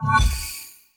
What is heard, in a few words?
fire Strike